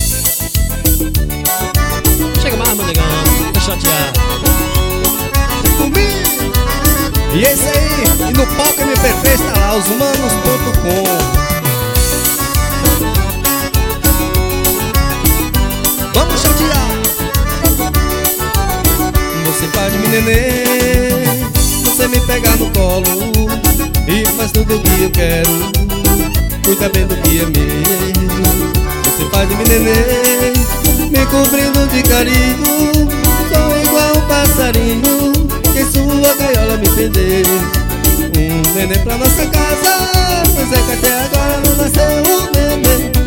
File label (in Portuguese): xote